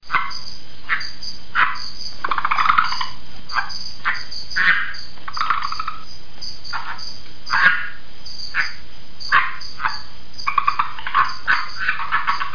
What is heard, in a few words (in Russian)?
krasnoglazaya kvaksha agalychnis callidryas